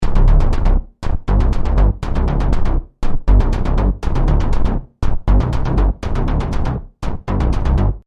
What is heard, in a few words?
Loop Rhythmic Intensity, music loop; Royalty Free Miscellaneous Loops